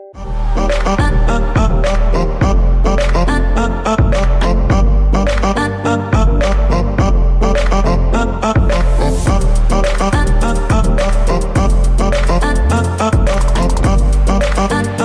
Mobile Ringtone

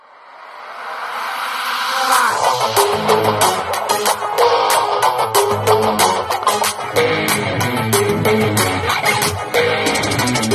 Arabic Song

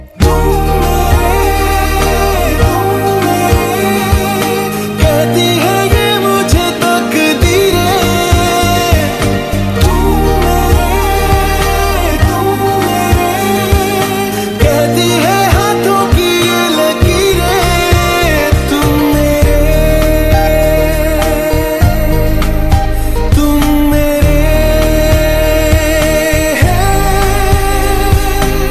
Best Romantic Hindi Ringtone

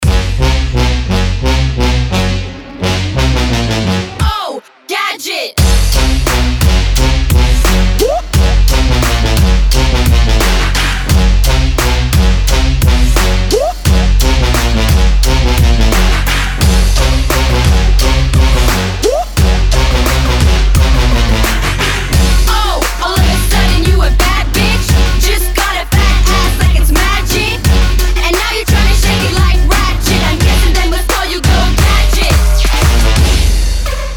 Hip Hop Ringtone

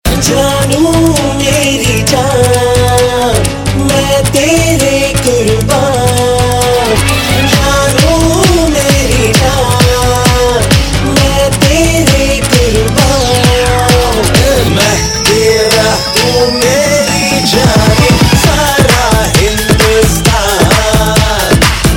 Bollywood Song Ringtone

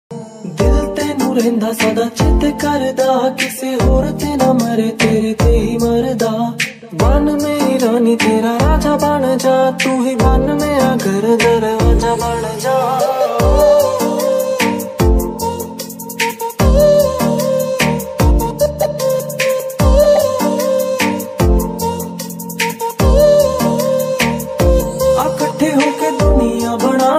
Punjabi Song Ringtone

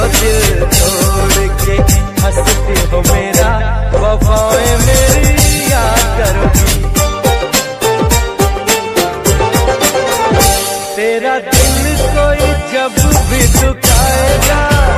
Sad Hindi Song

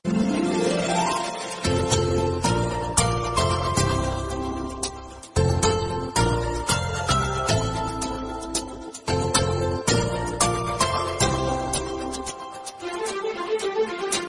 Nice Music Ringtone

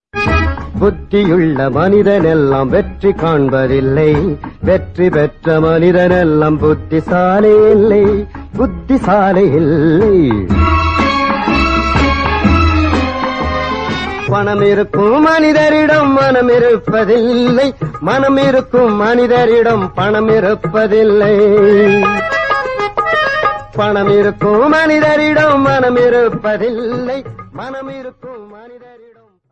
Tamil Melody Remix Song Ringtone; ringtones

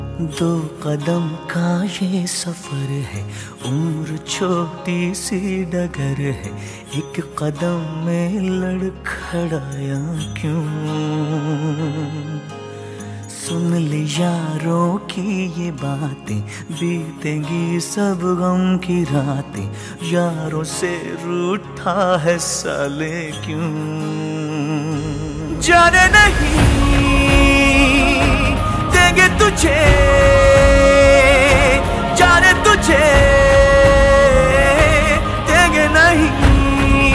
Friendship Song Ringtone

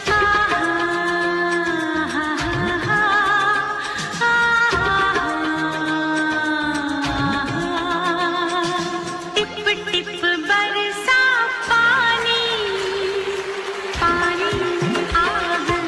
Romantic Hindi Song Ringtone

